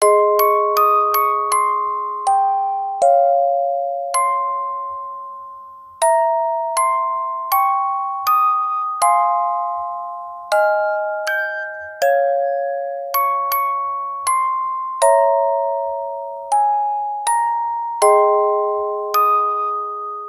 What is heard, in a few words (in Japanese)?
orgel